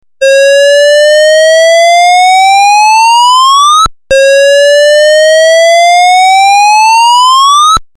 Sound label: evac